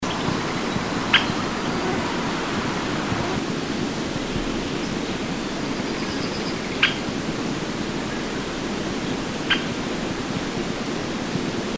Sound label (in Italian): Picchio dalmatino